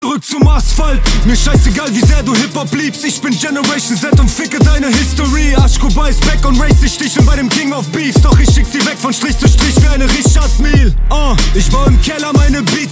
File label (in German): Rap klingelton